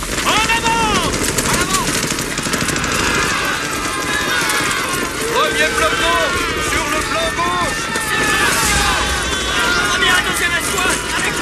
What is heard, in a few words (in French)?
Bruitage